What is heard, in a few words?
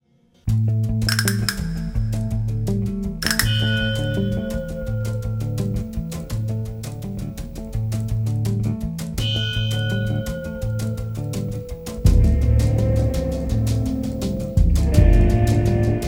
Trance